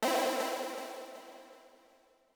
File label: Stabz, FX